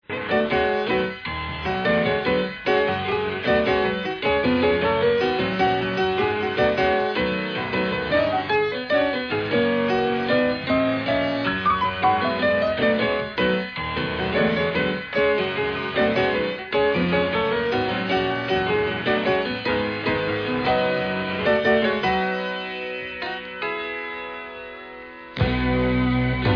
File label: It Took A Carpenter (instrumental)